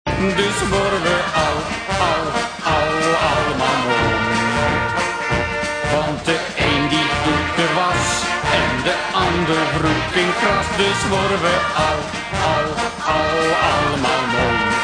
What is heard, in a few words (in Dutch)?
lied